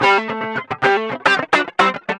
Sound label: GUI, LICKS; 110 LICKS